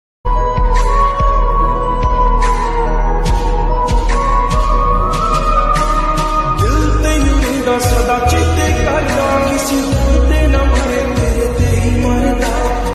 ringtone lofi version

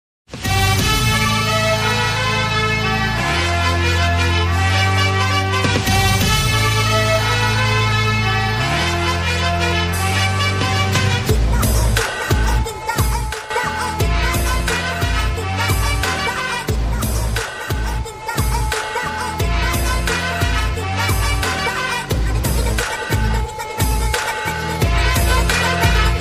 bgm ringtone south bgm instrumental ringtone; cd creation

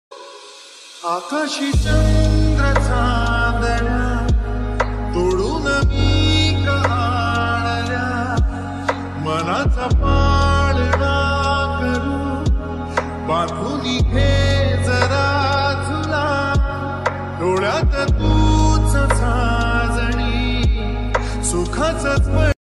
new marathi ringtone populer song

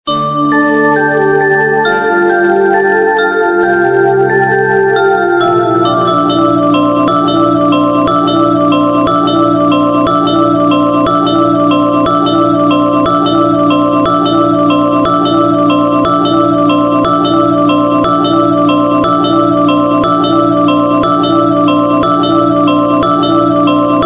love ringtone, hindi ringtone

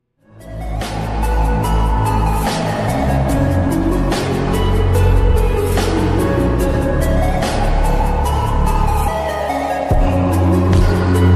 slowed and reverb ringtone english tone

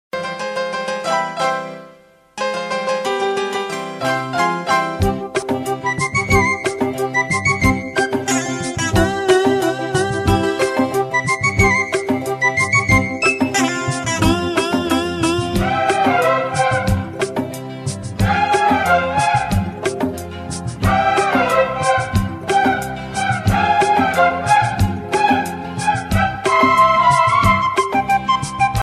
melody bgm; rajini

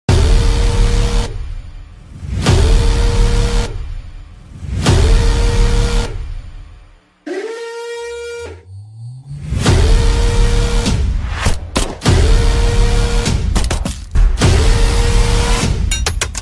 mass bgm car chasing bgm; KGF

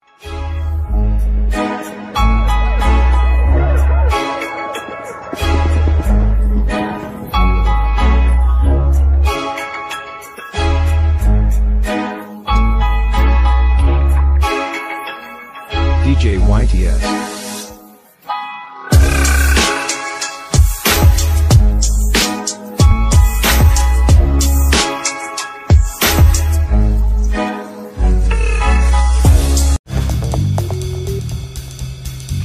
gangster attitude ringtone

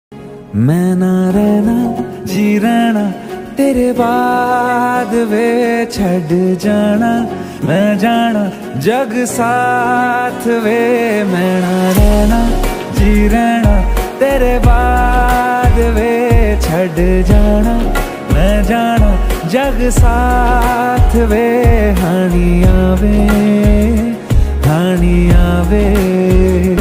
new hindi ringtone love; T-Series

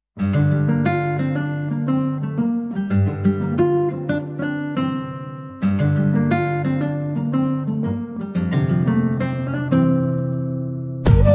Christian ringtone, Christian bgm

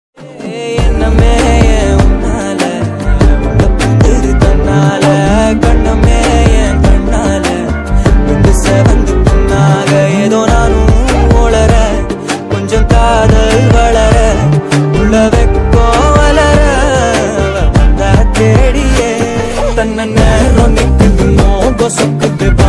bgm ringtone, song tamil bgm ringtone

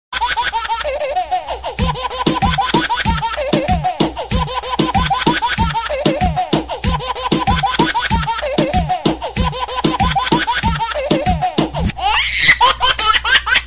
baby laughing funny ringtone

